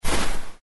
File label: Curtain Down; Bunnicula - Ghost Guard